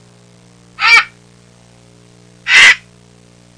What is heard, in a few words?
Vulture bird sound effects